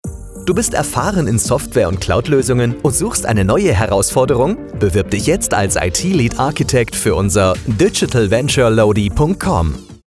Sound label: Funkspot Lead